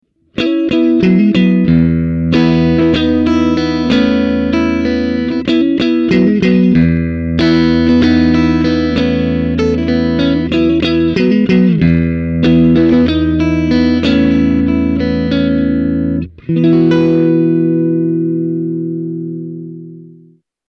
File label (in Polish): Fen Czysty SG, humbucker; classic strat humbucker